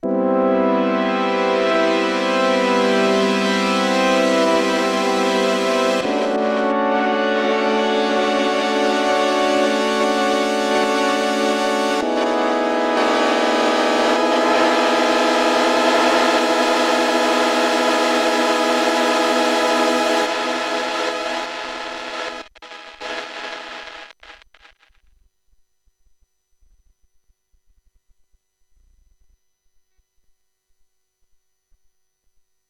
MOD Devices MOD DUO X J6 Delay Munge Manual Delay Time